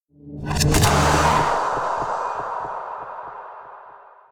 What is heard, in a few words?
stinger horror; markthechef; sonniss gdc